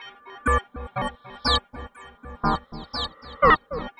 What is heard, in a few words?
Tape Arp